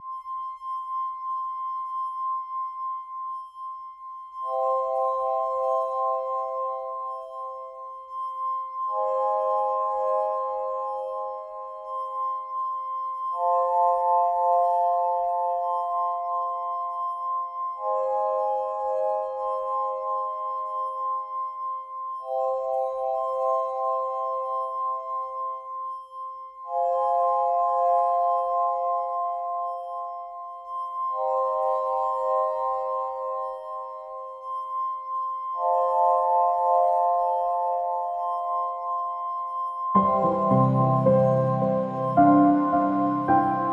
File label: Tranquil Winds